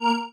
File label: marimba spacesuit; ゆめ2っき